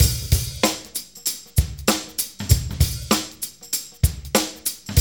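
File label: 96 bpm, Funky Drums; AMG; Now CD-ROM (Roland); Neil Conti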